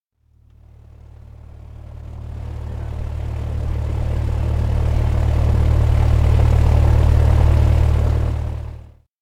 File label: close motor; anemoi